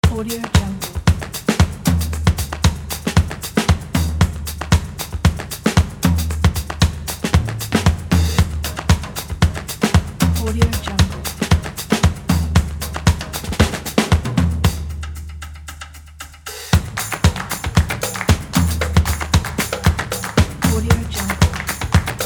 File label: creative drum beat; sergmusic